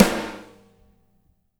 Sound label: hyper hi, snares; Spectrasonics; Burning Grooves